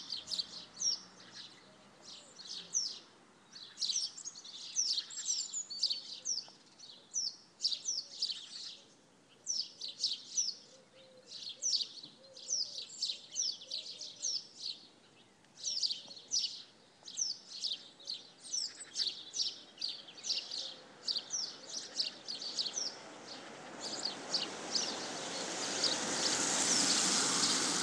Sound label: beautiful birdsong on walk home